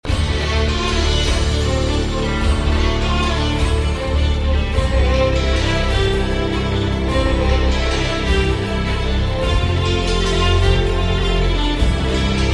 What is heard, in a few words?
violin cover